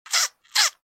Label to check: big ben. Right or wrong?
Wrong